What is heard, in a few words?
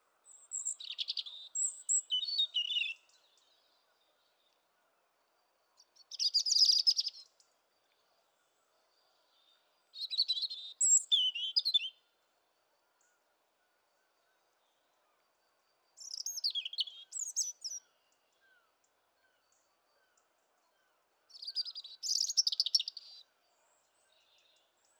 Liepsnelė - European Robin - Erithacus rubecula